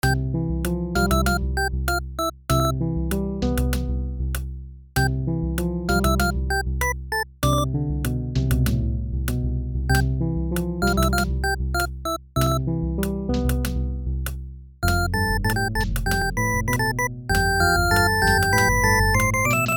lobby chip

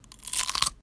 crunch

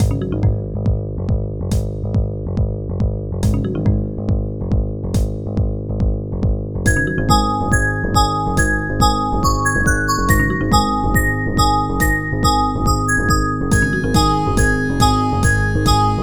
dungeon

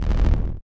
screen shake

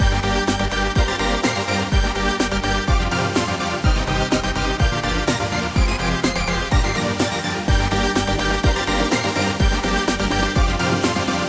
synth; Electronic music / chiptune 4; Short loopable song that maybe could work well in a boss level

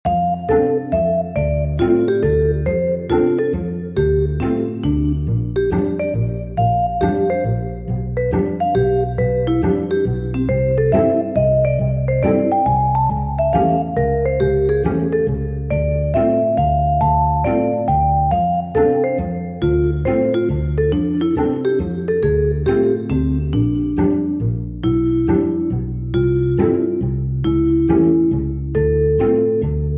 cello vibraphone

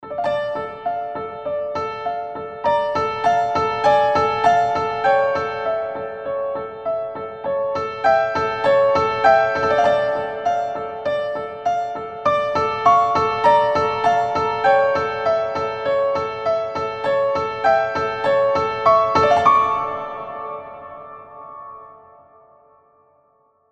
Piano Bright

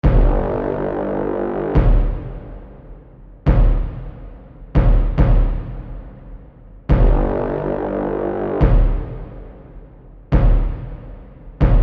high tension

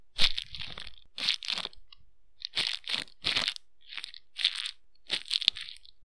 paper crush

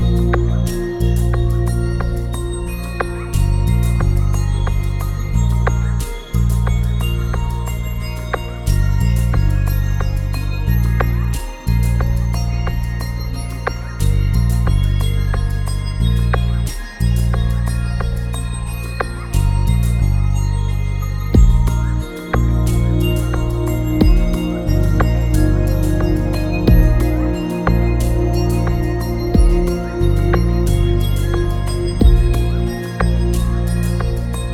neon night market

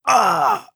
death groan male

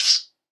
alien hiss

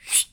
Arrow Passby

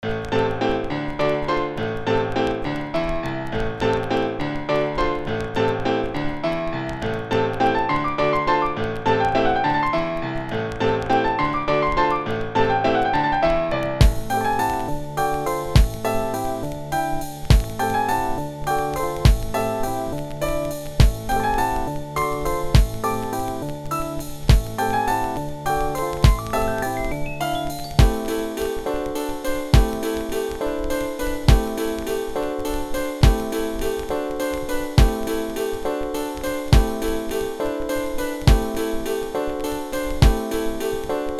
ragtime

